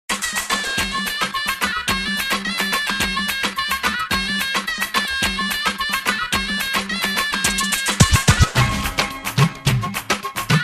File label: Bansuri